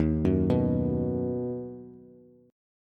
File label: Eb Minor Sharp 5th, Bass; Ebm#5 arpeggiated; JGuitar